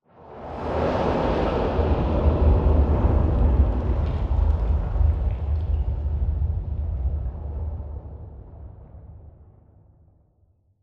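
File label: Explosion Rumble Exterior Distant Tail; Boomtown; Titanfall 2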